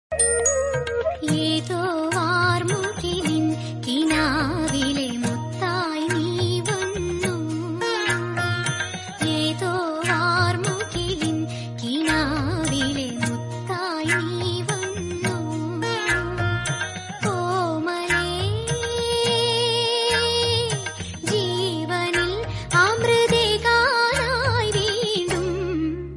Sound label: Malayalam Ringtone; ringtones